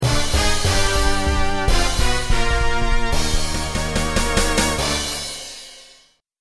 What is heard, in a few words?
game over; gundam wing endless duel